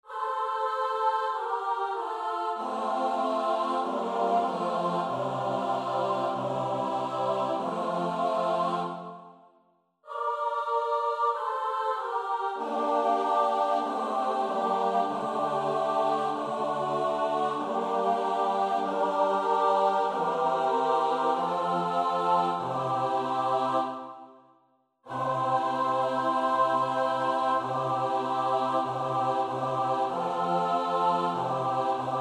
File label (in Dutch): S-A-T-B, koorpartij oefening; Bruckner (Anton); Christus factus est (WAB 09)